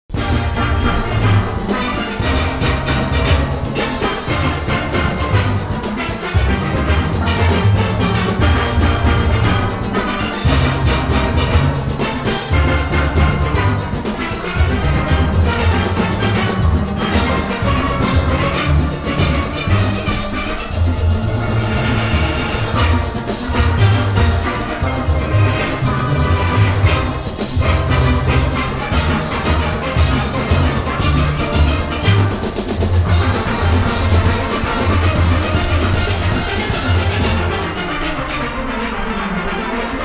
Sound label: Adlib Rain Melody, steelpan music